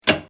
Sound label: Door Latch